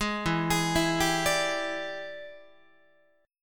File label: E 7th Sharp 9th Guitar, chord; E7#9 arpeggiated; JGuitar